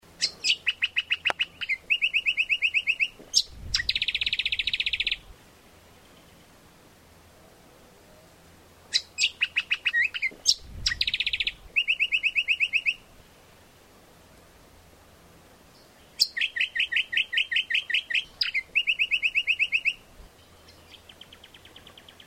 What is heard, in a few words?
audio lichmera indistincta